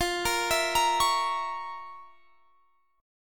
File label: F Major 7th Suspended 4th Sharp 5th, chord